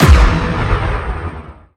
FX Boom

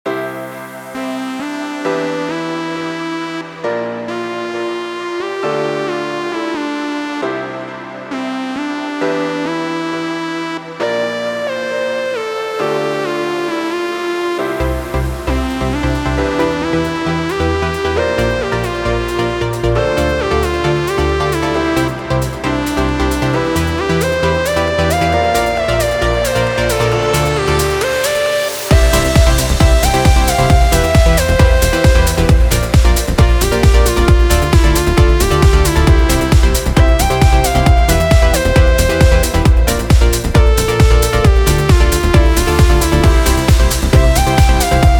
134BPM Complete Mix, Cmajor; Vengeance Samples; Lovely Day Kit

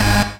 Synths G#; vengeance essential club sounds-3

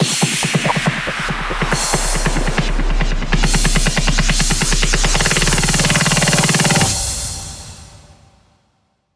140BPM 4Bars, HandsUp Trance Fills; Blackout; Vengeance Samples

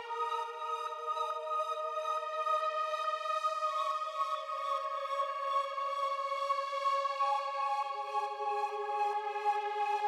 Atmopad 138BPM, A#minor; Melody & Synth; Vengeance Samples; Fusion Kit

